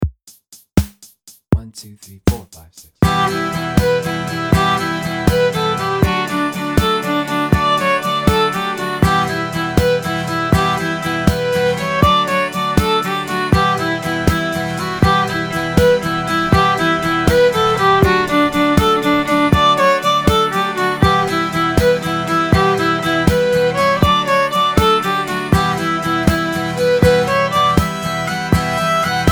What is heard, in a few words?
swallowtail jig full mix 95bpm, fiddle; trying triads